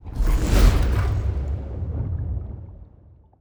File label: ship juggernaut move; aquatics